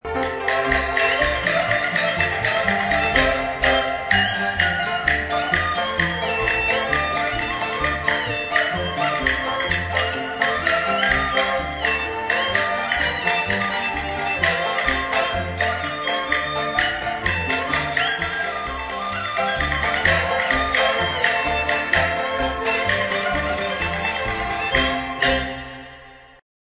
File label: JingHu